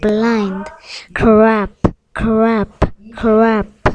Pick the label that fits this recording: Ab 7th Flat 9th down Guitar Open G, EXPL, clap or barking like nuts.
clap